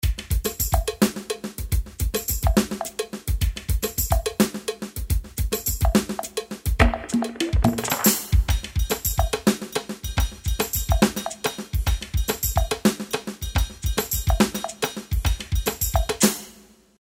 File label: DR-770 afro